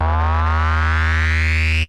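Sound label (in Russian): бас гитара электро со свистом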